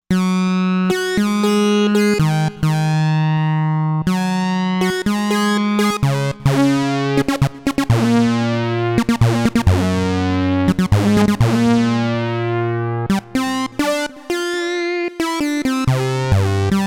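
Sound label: Lead P5 Sync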